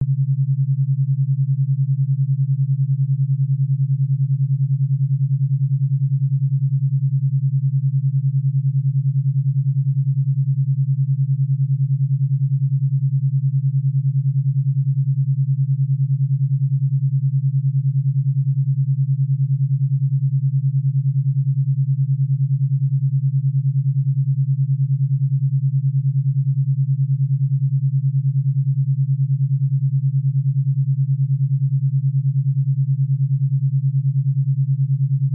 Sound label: mid alpha, binaural beats